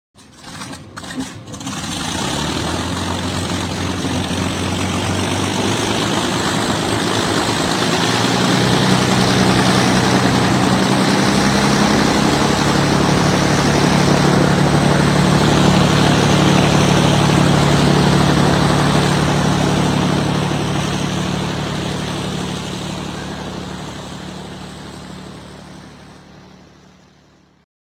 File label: Lancaster bomber starts up